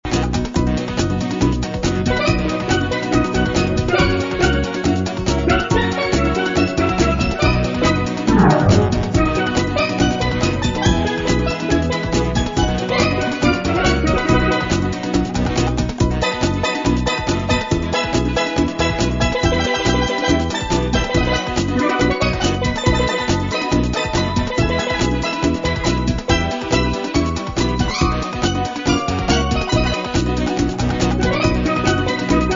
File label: Merengue